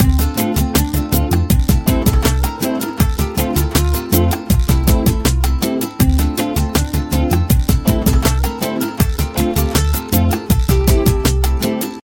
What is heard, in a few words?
latin loop ringtone